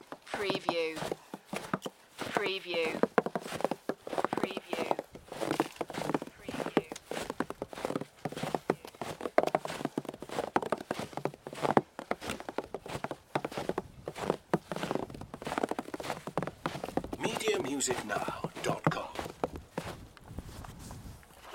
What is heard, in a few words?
Snow and Ice, Footsteps Snow on Wood